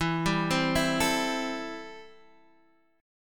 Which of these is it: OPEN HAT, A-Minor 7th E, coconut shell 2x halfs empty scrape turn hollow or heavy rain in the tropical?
A-Minor 7th E